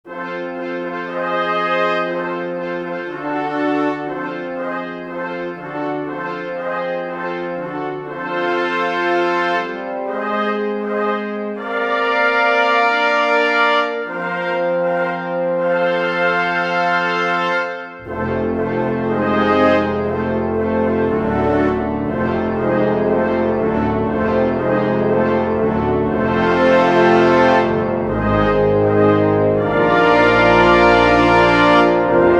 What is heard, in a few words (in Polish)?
hejnal